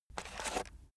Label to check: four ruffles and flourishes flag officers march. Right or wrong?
Wrong